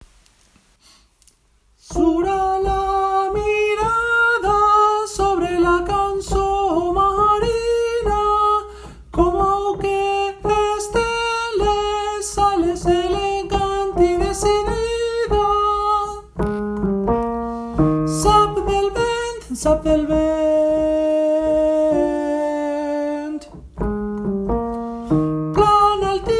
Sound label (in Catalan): Cançó de mar ALTOS